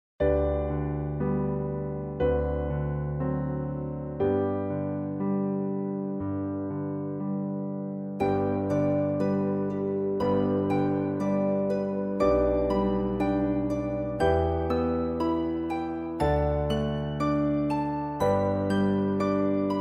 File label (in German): Bell Songs mit Trommelfeuer